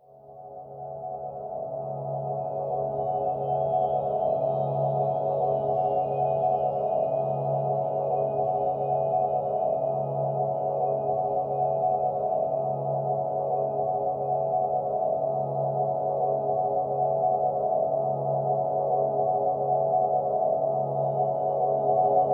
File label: Guitar Textures